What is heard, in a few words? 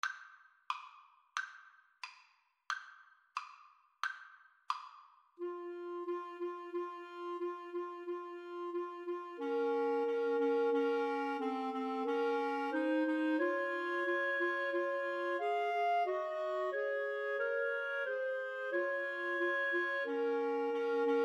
pavane, Clarinet Trio